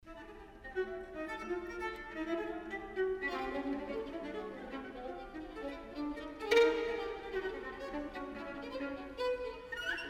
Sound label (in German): quartett